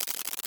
Mechanics; soundbits

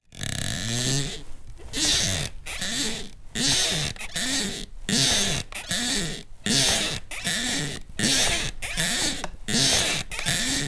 household squeaky rocking chair

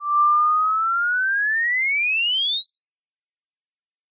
cartoon ascend whistle classic; zapsplat

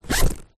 bag camera bag zipper; foley

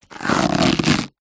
foley rubber thin elastic stretch stress; zapsplat

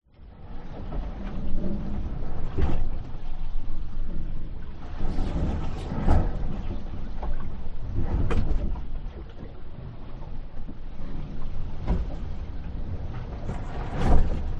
Boat Sail; audio hero

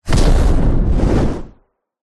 explosion designed big distorted fire backdraft; zapsplat

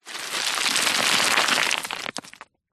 foley stones rocks small landslide fall down; zapsplat

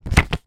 foley photograph handling; zapsplat

